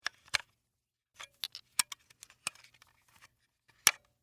Batteries Remove, Technology, From, Charger, Aa, Battery; Miscellaneous Electronic; Blast Wave